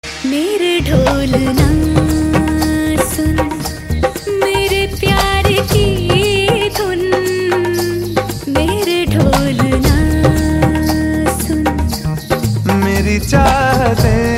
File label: dance song tone ringtone, bollywood ringtones